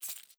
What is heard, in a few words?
Geo Small Collect